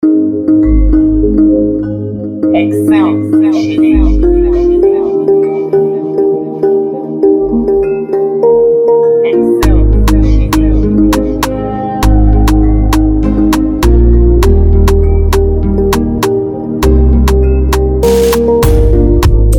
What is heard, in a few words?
Instrumental